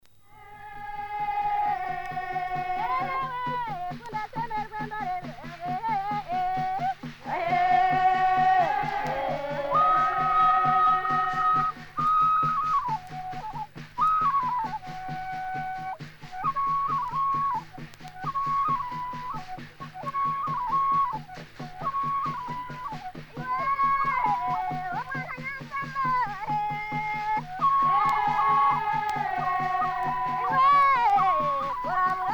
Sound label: Omubanda, uganda